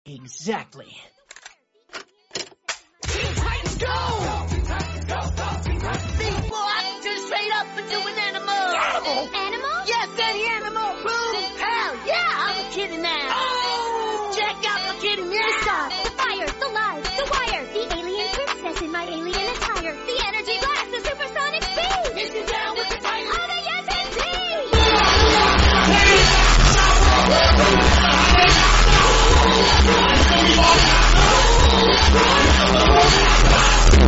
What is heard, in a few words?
bass boosted; teen titans